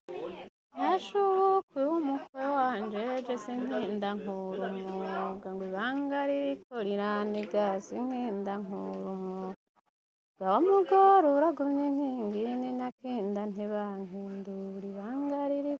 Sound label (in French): chant des fetiches; Indirimbo